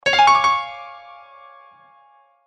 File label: arp trill